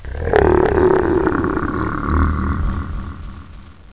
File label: attack